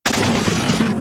MUDRAPTOR death

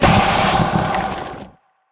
ABYSSM tongue move

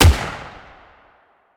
WEAPON hmg Shot

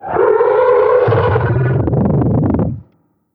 attack; TIGERTRESHER

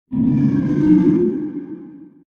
Scorpion Idle

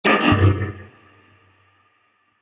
damage; CARRIER

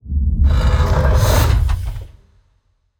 Docking Port

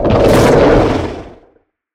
MANTIS attack

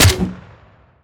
rifle Grenade Shot

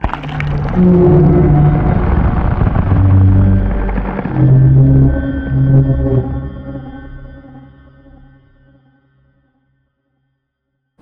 JOVE idle

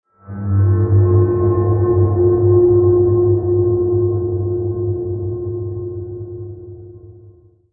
Ambient

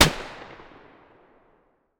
machine Pistol Shot

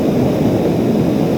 Flame Thrower Loop

